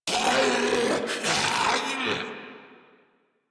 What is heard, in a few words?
Laugh Ghoul Sarge, Barks Battle; Grineer Ghoul Drill Sargeant